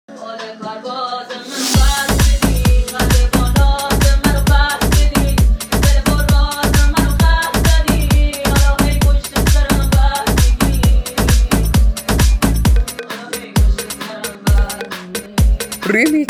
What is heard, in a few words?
music sad remix; آهنگ غمگین گریه آور مخصوص اینستا